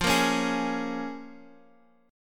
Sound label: Db 6th